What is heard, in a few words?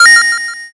coin